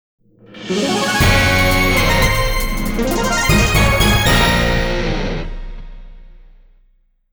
Success Jingle; Pachislot Akumajo Dracula

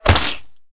bullet impact body flesh; Infernal Radiation

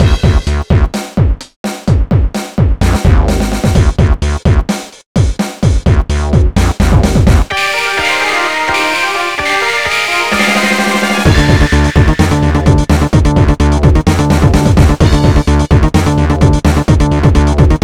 BGM Sabaku Battle mid; Irodzuki Tingle no Koi no Balloon Trip; NDS

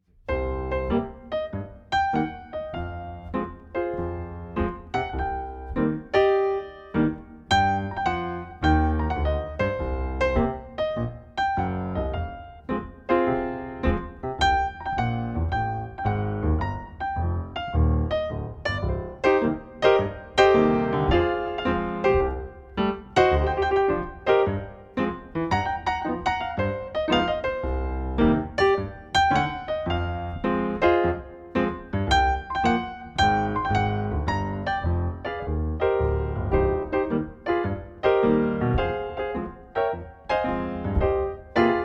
Piano Version; Cuphead

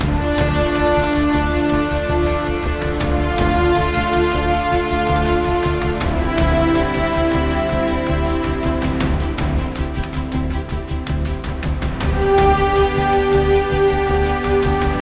Mood High

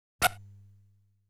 Sound of Boss Arm Damaged

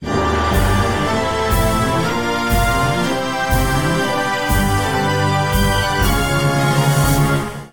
Beat End Of Mission; Monster Madness

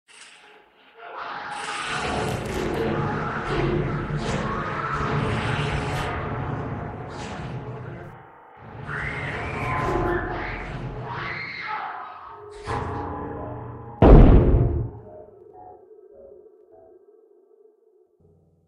Normal Office Sounds

